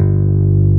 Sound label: bass